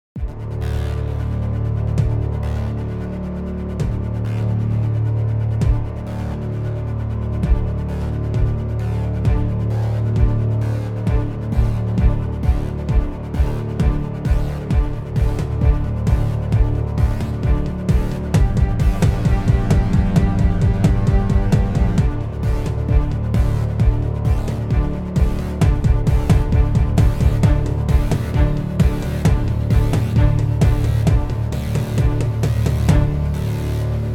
Synthetic Build

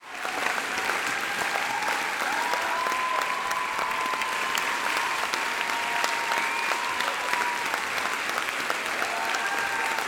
Applause

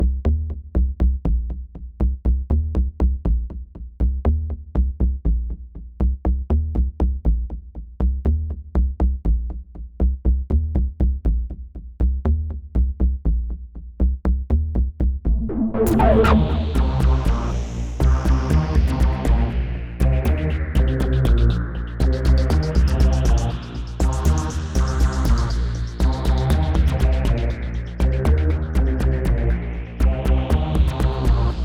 Marching Space bass Loop

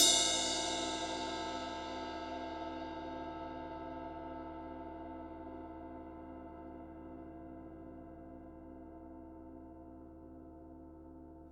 Ride Rim

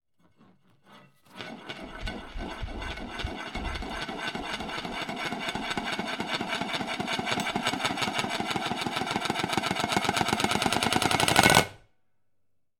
Plate Rotating On The Floor; Zoom h4n xy

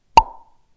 Pop

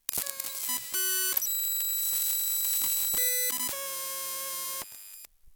Camera On Off

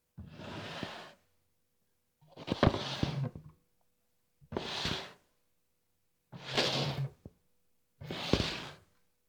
Chair On Wood Fast

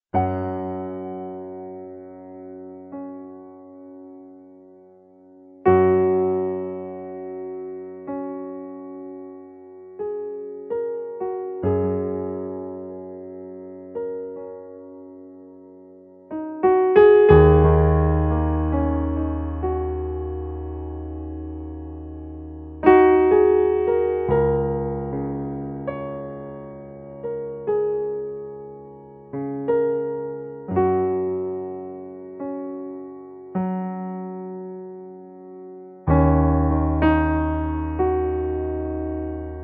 Melancholic Burble In F Major

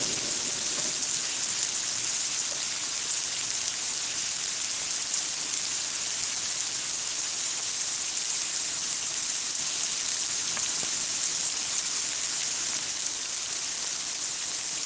Frying Sound

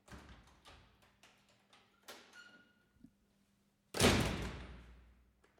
Door slam Lock

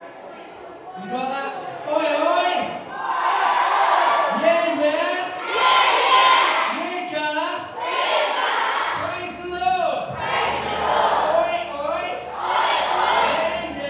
Kids club

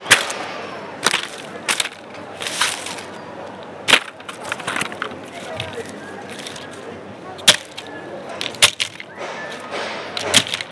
Breaking Plastic

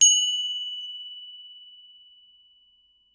Bell little medium strike

